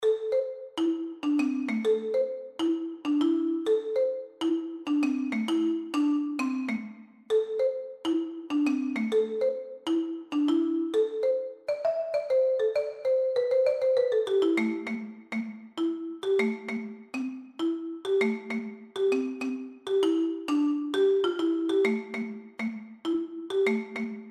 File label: Funk Study, percussion